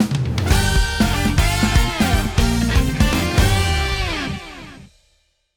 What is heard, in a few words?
stinger win